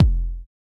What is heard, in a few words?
kick